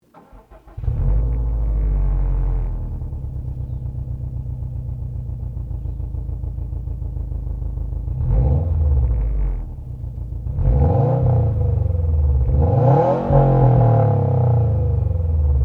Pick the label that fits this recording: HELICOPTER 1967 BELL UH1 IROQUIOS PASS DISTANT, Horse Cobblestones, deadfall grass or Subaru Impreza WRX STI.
Subaru Impreza WRX STI